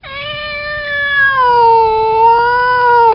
4 syllables meow howl 920Hz, cat; sylvester the cat

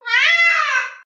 3 syllable wah ahah 2026Hz; sylvester the cat

